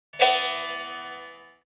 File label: play lute; Immortal